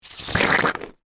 Suction Mud Gurgle, Texture, Suction, Wet, Gurgles, Mud; Water Sound Effects; Water (Sound Effects:Nature)